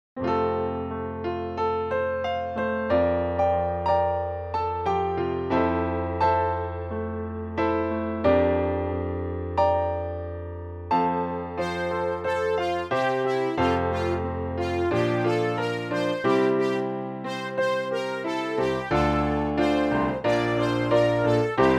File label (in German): Klavierbegleitung